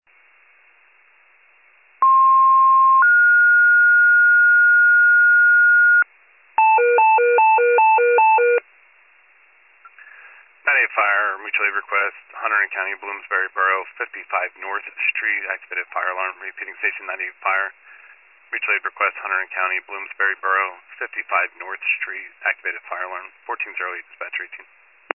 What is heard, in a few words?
FIRE pager alert